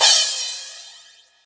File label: short reverb cymbal sound clip f key